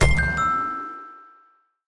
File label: game show quiz use lifelane